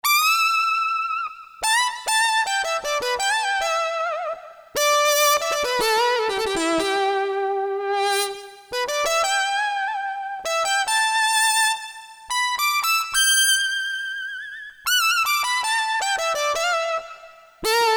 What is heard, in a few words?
METBRAS, Korg DSS-1 Metal Metalism